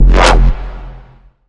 Bend The Fast Lights

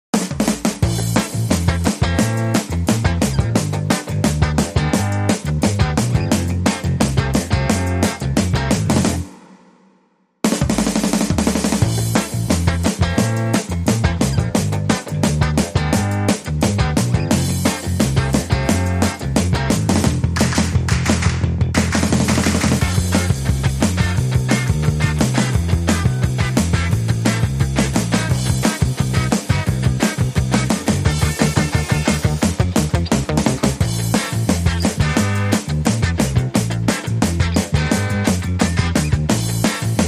No Organ No Leads Guitar

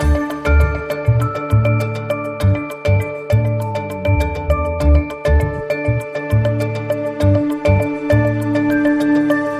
Loop

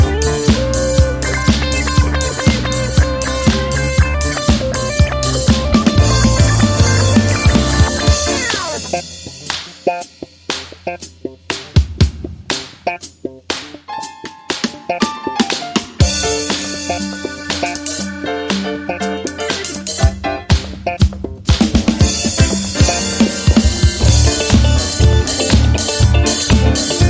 That Disco Beat

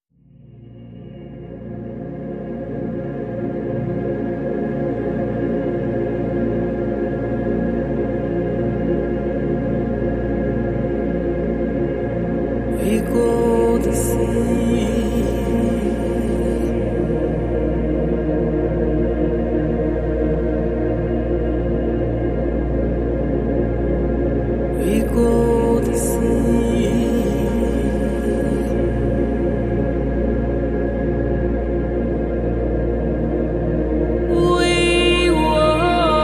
Rain Forest, No Drums

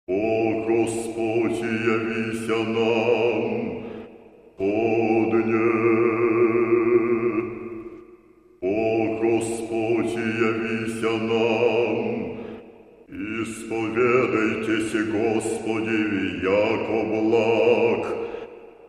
Russian Monks

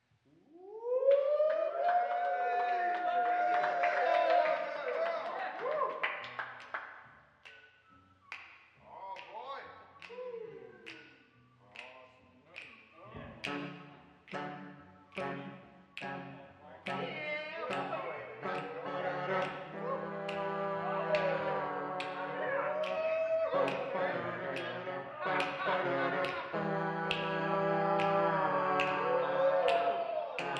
Jazz Club

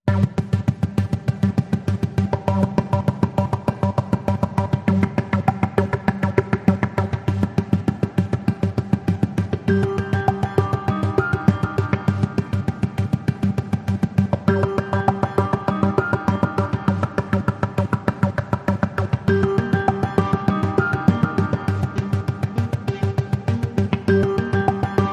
Pads and Pulses Only

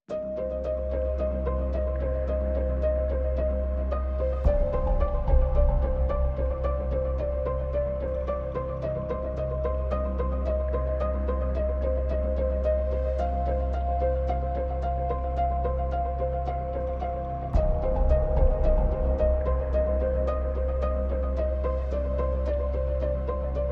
Pads and Pulses Only

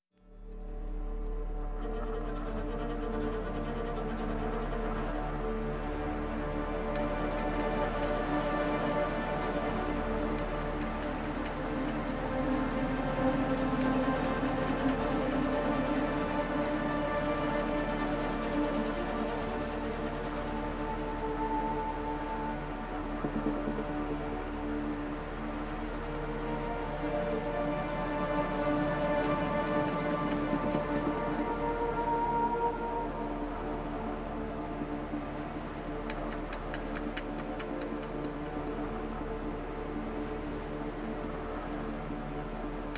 No Lead No Percussion

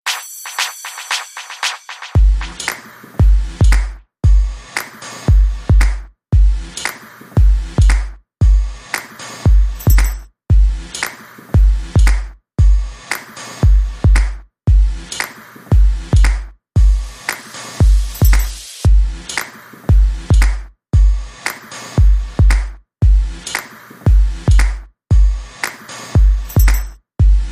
Taste Beat

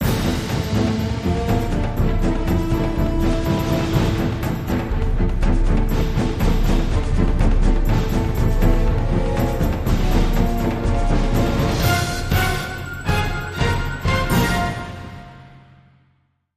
Advancing Drums

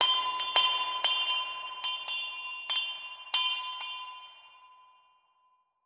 Store Bell

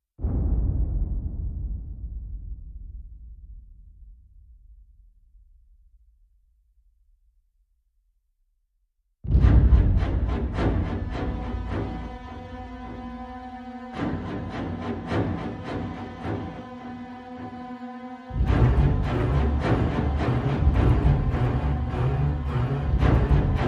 Orchestra Only

